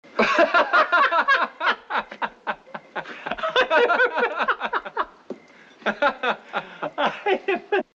laughter

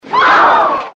alarm

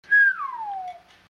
whistle

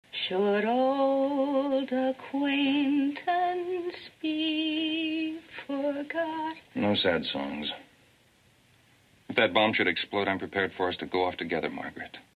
sad song

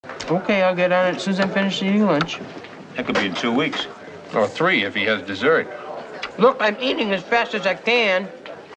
eating fast

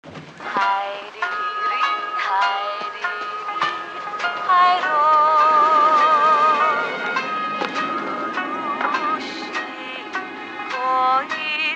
singing

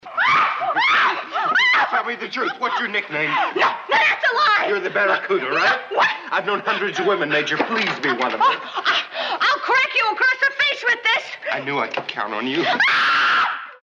screaming

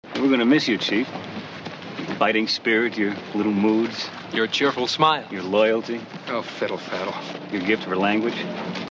fiddle faddle

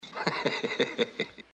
laughter

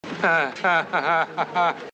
laughter

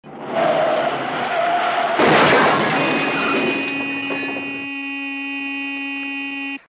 crash

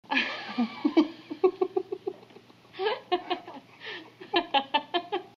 laughter

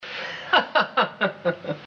laughter

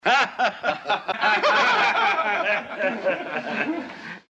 laughter

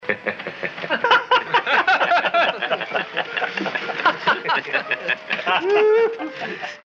laughter

